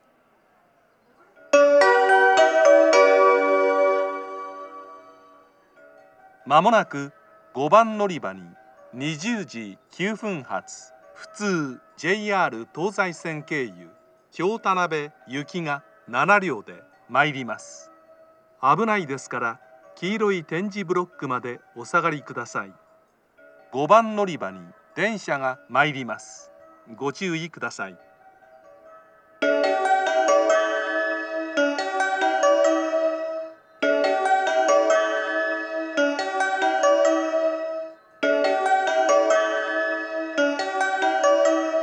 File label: amagasaki local kyotanabe; station; jr-west